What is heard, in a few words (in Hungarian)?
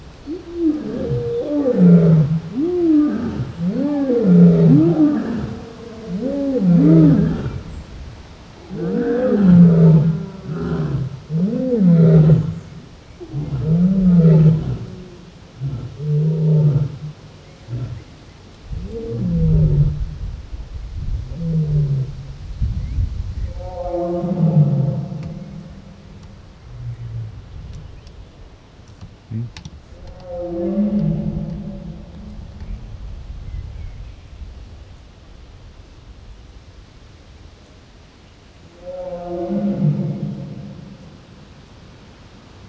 oroszlan es tigris szel; mikrofonalvany; veszpremi zoo 2013